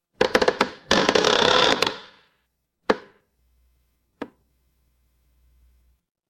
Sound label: horror creak